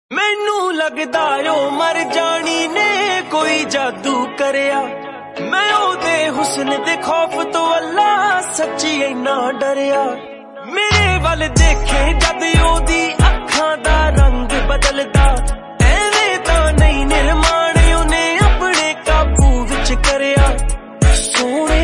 Punjabi Song Ringtone

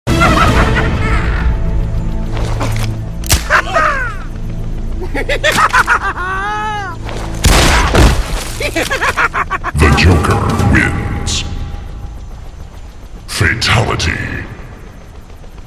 Instrumental Tune; Joker Original Tone

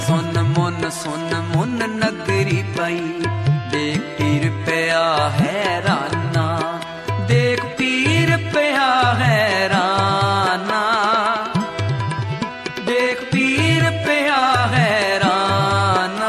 Gurbani tone

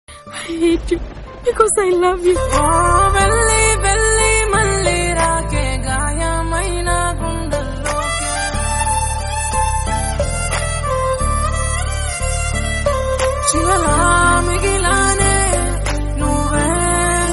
Love Failure Telugu Ringtone

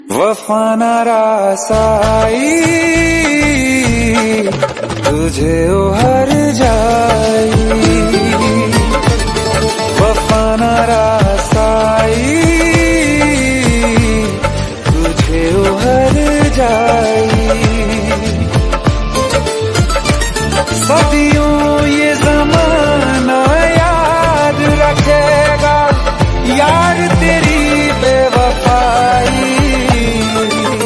Hindi Sad Song Ringtone

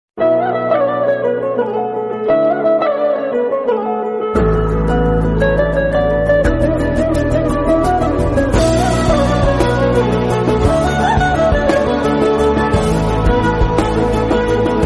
Telugu Love BGM

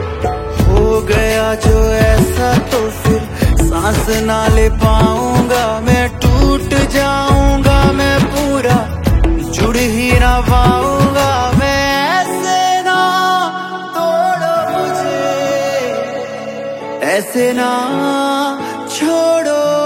New Song

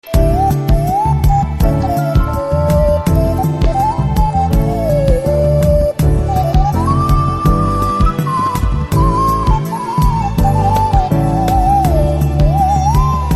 BGM; Cobra

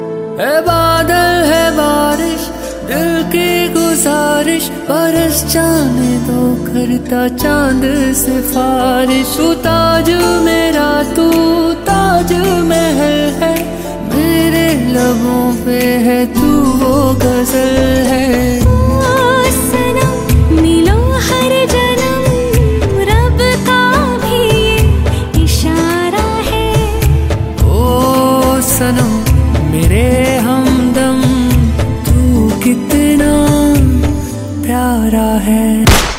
Song Ringtone

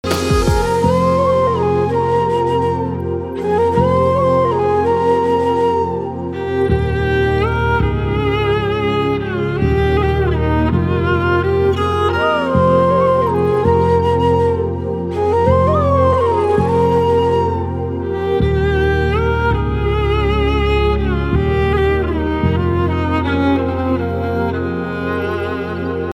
Instrumental

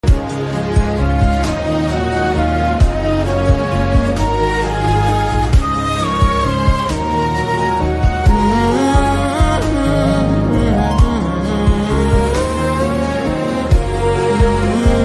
BGM Ringtone

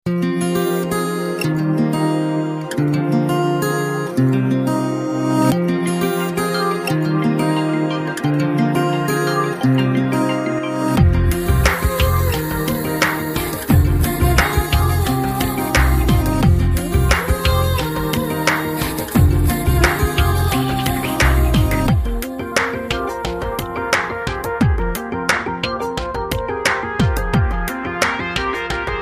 Very Nicely Tuned Instrumental Music

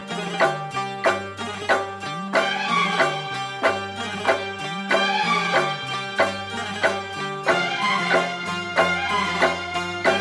Instrumental Ringtone

